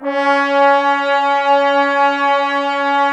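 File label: brass trombones C#, Woodwind Brass Ensemble; 90 s Sample CDs; Miroslav Vitous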